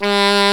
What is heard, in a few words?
FNK F#2, Funk Fusion; Roland L-CDC-01